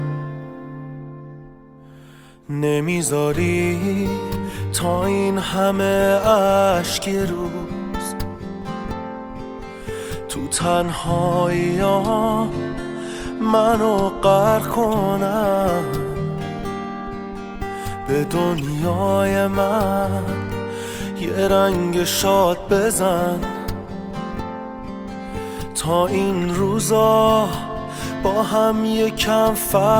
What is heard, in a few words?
Acoustic Version